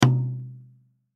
Drum Tom, Drum Tom 10 Inch Pacific 6 Ply Maple Shell Single Hit; Drum Kits; Musical; Blastwave